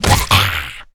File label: zombie hit; DeadFright